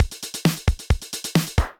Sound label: DMX Kit groove 133 bpm; MIDI Clips; DMX Admission Kit groove; Ableton; Factory Packs; Drum Essentials